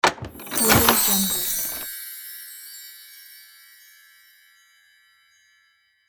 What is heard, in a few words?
opening magic chest